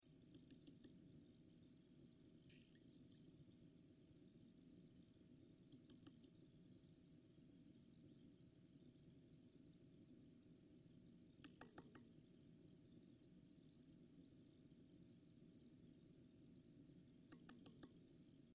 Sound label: Leptophyes laticauda; BIOAC ORTH